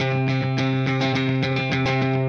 Clean Funk Guitar; Sonic Foundry (Sony Creative Software); Indie Rock Anthems; Session 02 - Clinical

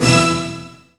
HIT; AKAI S-Series CD-ROM Sound Library VOL-8

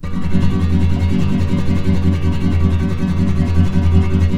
FLAM TREM CH, Guitars; Spectrasonics; Hans Zimmer Guitars VOL-2

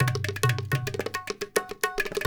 INDIA L; Spectrasonics - Heart of Asia CD2

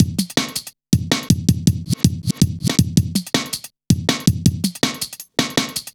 Tinkle, drum loop, jungle, 161.5; Zero-G Jungle Warfare 1, 2 & 3

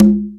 PRC CGA, AFRICAN PERC; Roland LA

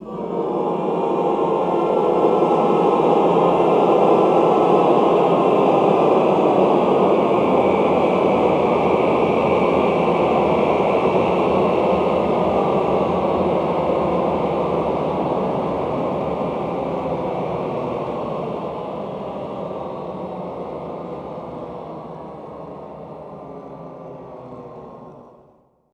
classical choir, cluster wave; Best Service; Extended Classical Choir

